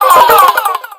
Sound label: burst alert ringtone